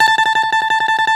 Balalaika, Prima Balalaika, Plucked Strings; E-MU Producer Series Vol. 7 – Old World Instruments

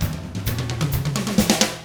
127 Drums

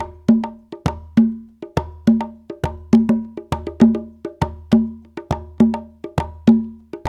135, Latin Congas; Spectrasonics; Supreme Beats

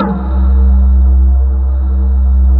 MALLET C2; MALLETTE

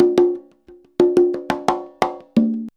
CONGA 089, Percussion Loops; USB Soundscan vol.56 - Modern Percussion Loops; AKAI

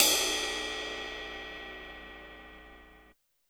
CYM, 180 BPM; Ueberschall; Drum & Bass Flash Trackz

